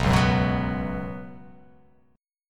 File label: B Suspended 2nd chord; strummed; JGuitar